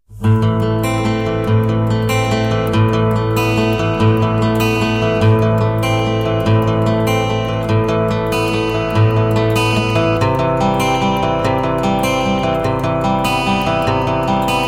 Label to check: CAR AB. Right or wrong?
Wrong